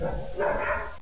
gorilla

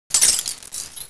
debris glass; valve

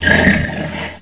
Alert; valve

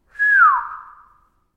calling whistle; garrysmod; prop hunt; taunts; props

